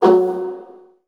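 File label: viola pizzicato F#1 right, Virtuoso Strings; ILIO; Kirk Hunter Virtuoso Strings VOL-2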